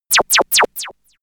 laser machinegun; krieg-waffen; soundpool

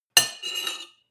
cutlery on plate scrape; soundpool

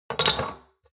shot glasses stack hard; soundpool

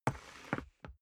step boots wood parquet scuff; schritte

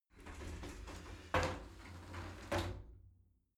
shower slide door open soft

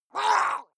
water monster female gargle shout attack short; koerpergeraeusche; comedy-cartoon; soundpool

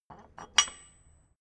metal drawbar handling

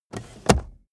car accessories cup holder cover open fast; autos; verkehr transport

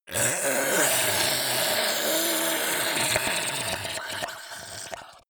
zombie grunt choke male slimy; kreaturen

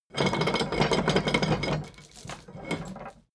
drawbridge winch hoist short; maschinen; metall; soundpool

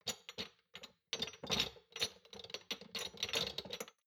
shot glasses rattle very soft, gastronomie; berufe; soundpool

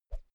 whoosh thin quick soft; swooshes-swishes

